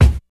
short kick drum c sharp key, Loudest frequency: 299Hz